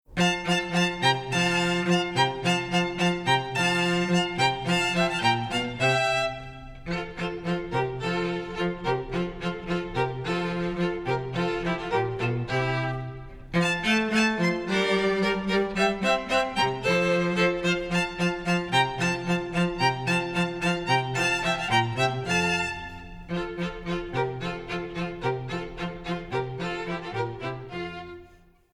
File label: trio; autumn